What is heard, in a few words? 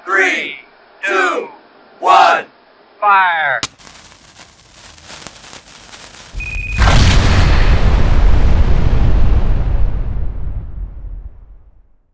bomb; busterbomb; Particle-Sequencer